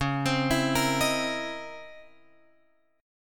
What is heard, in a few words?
C sharp Minor Major 13th, guitar chord